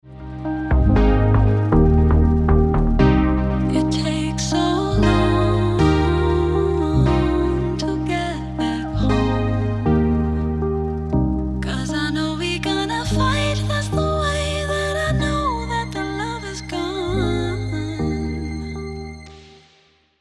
Ringtone

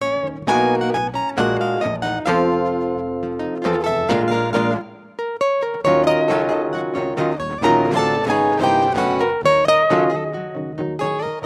Marimba Ringtone

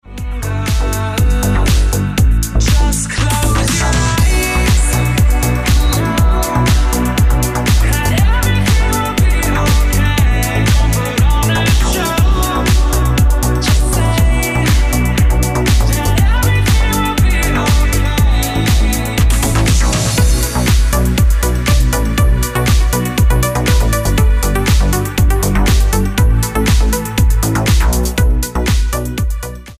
Ringtone

